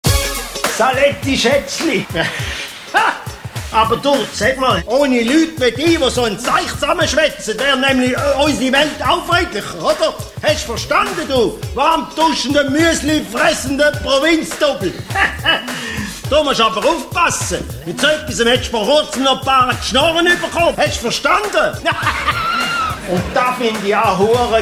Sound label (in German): Klingelton; Harry Hasler